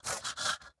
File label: Striker Breath Run